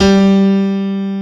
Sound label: AC PIANO; PIANOS+KEYS; Pro-Rec - Synthsonic